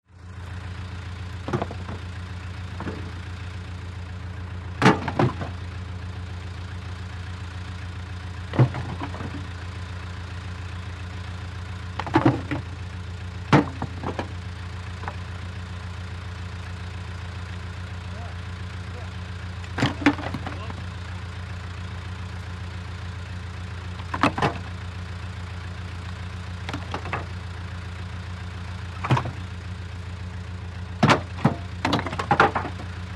VEHICLE - CONSTRUCTION JOHN DEERE 750: EXT: Idling, rocks loaded into bucket by hand, intermittent voices.; 750 Dozer